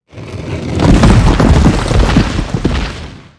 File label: die; Seer; GamigoNA